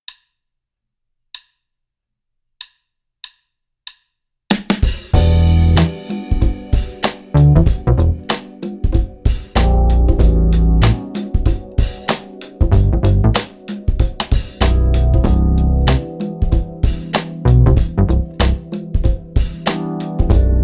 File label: e minor 95bpm